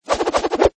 Knife Whoosh, Knife or dagger being thrown and rotating through the air; Combat Swings